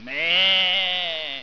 sheep

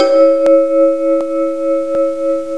BELL, Chimes Bells

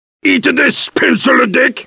EAT

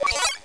item get

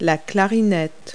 clarinet; Multi-Lingual Talking Picture Dictionary

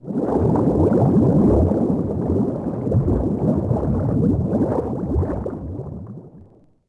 bubbles; DAoC

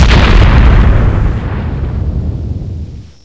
big; axisdemo; explode

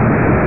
thrust; offender

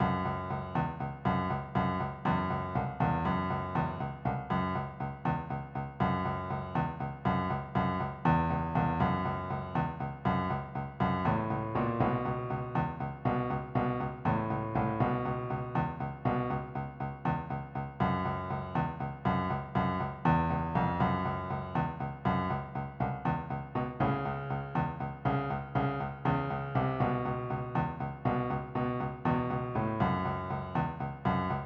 blues

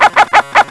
Dog; WUZALEEBUB 2; Adventure

